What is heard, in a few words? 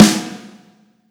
soft hit clap